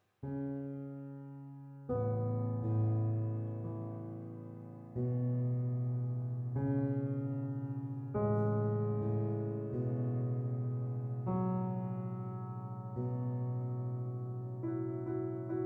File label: World Music